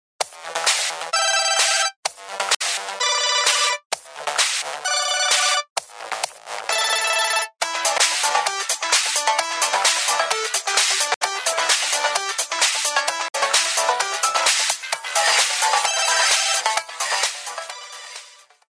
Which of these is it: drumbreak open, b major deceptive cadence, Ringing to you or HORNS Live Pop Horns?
Ringing to you